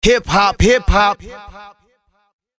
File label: HIP HOP HIP HOP